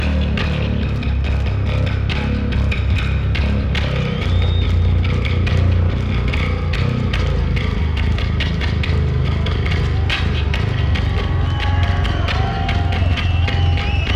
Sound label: Bass Solo